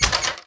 door metal locked; mechanics; Spearhead Demo; MacFormat 2003 June

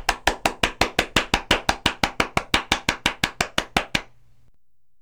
clapping

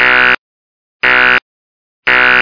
ALARM

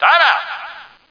TIMEOUT

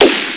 gun blast

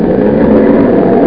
goo

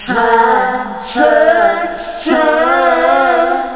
vocals

